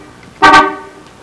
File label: CAR HORN